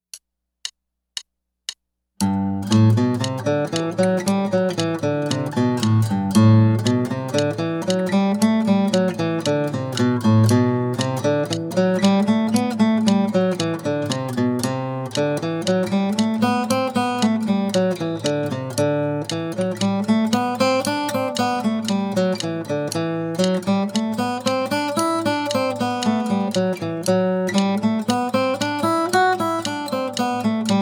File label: A Scale Study